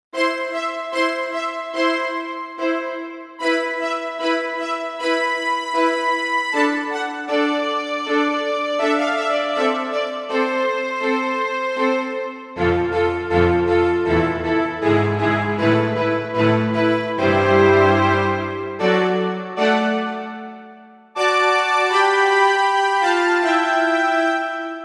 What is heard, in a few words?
String saw, Korg MSC-1S; Korg MSC-1S Variety